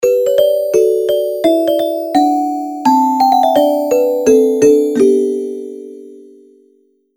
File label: jingle